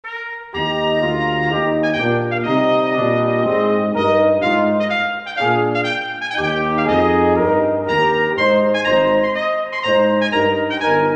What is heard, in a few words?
Trumpet Tune; Purcell